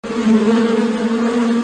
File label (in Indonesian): lebah